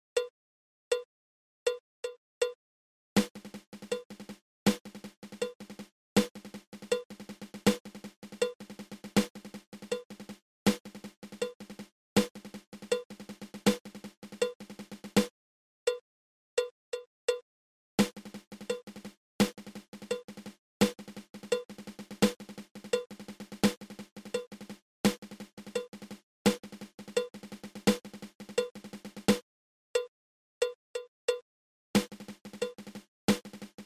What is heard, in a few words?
Triple Ratamacue